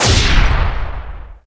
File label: normal hit whistle; osu!